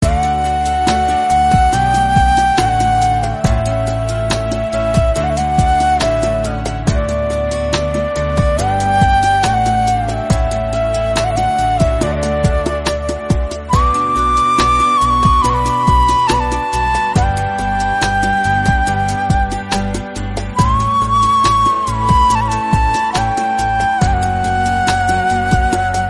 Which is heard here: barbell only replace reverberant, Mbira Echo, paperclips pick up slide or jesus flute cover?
jesus flute cover